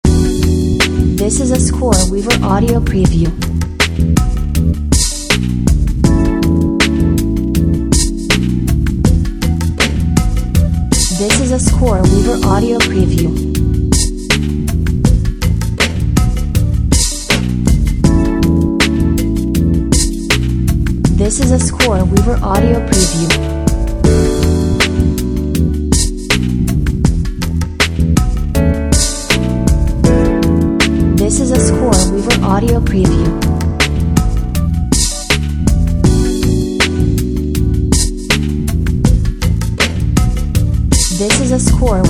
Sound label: relaxing in the lounge; scoreweaver